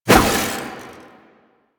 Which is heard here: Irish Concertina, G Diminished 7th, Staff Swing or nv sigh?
Staff Swing